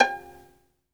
SOLO VI, Synclavier Strings; ILIO

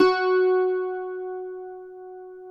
cuatro F#; Spectrasonics; Hans Zimmer Guitars VOL-2

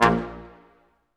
BRS Quintet staccato; Roland L-CD702

